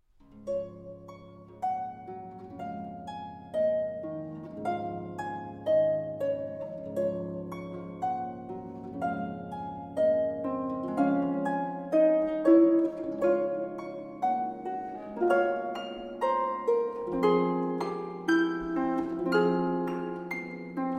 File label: Prelude in D-Flat Major, for Harp; VL 253